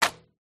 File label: clothing item wet throw hit glass window